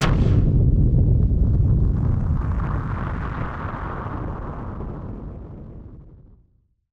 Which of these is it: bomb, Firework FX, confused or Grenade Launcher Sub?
Firework FX